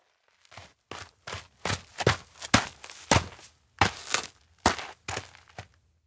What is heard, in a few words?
steps outdoor ground stop; fumiya; anemoi